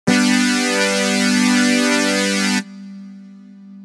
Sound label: Analog Ready 4 the Pad; jup8